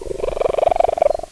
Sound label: cat idle